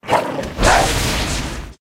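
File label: Pet werewolf buff; Oboroten; oboroten lvl3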